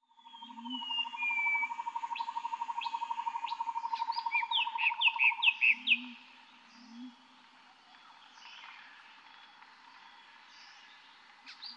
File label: Calm Forest atmosphere with bird chirps